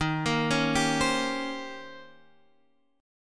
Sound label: Eb 13th, Eb13 chord; Eb13 arpeggiated; JGuitar